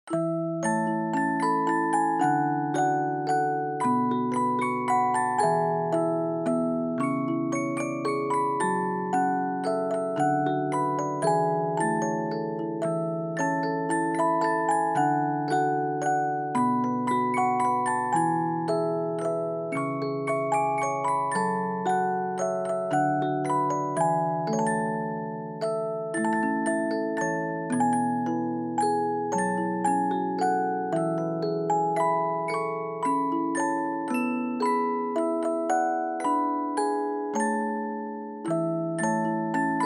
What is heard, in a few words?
Dry Fast; We Wish You A Merry Christmas